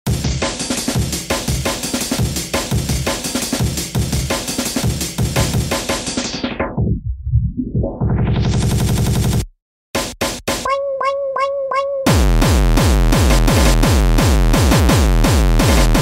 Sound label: hardstyle